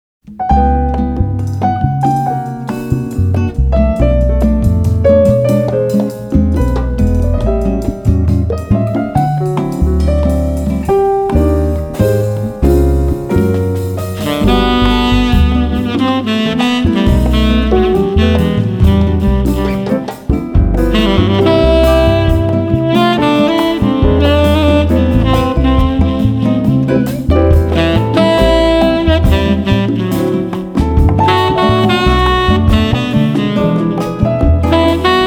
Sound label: Bossa